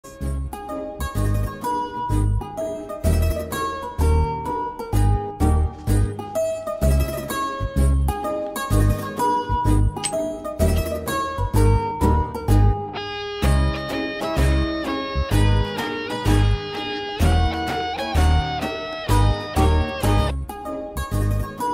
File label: lovely bgm; andala rakshasi